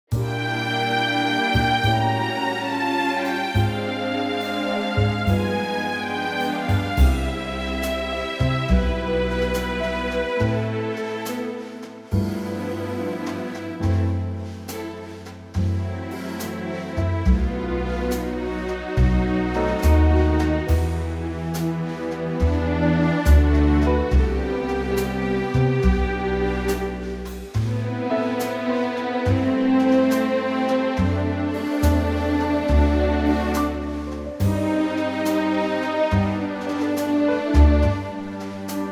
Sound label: orchestra